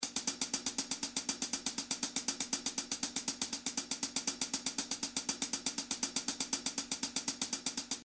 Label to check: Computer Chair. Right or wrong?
Wrong